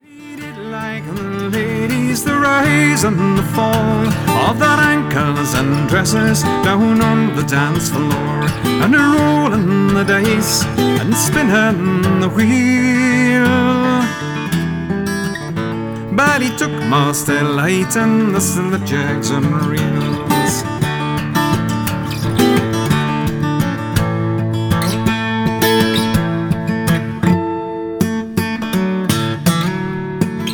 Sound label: Slip Jigs and Reels